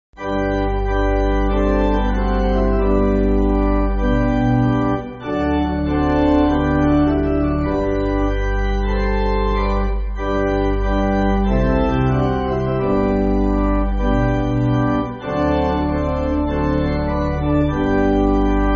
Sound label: How Kind Is Jesus, Pipe; Sweney